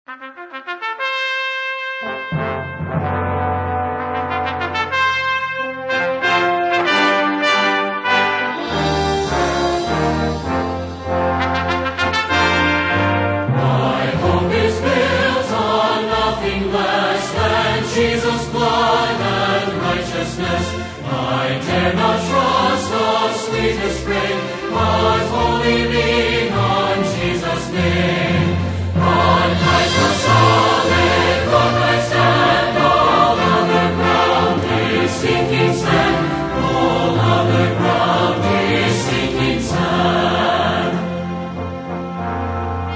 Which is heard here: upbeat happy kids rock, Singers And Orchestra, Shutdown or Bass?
Singers And Orchestra